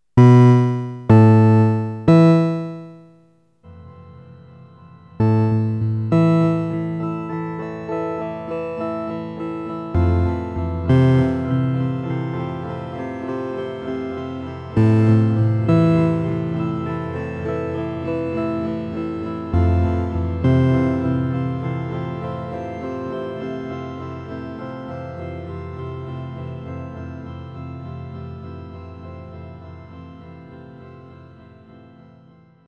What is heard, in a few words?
MicroFreak Pseudo Sequencer